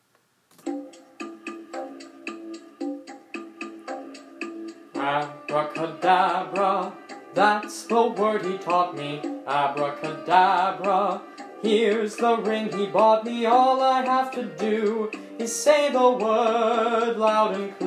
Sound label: abracadabra teen male vocals